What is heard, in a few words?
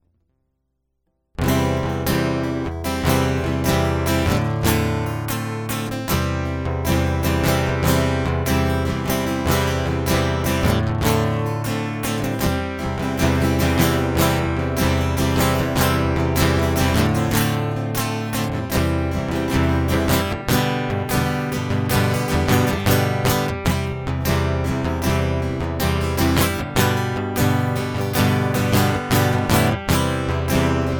Guitar Loop